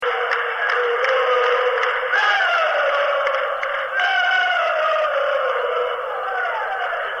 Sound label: lalalalala; Lalalalalala